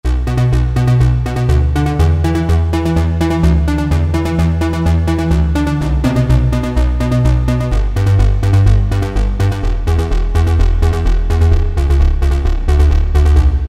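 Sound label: bass Seq, Yamaha TG33; Factory Presets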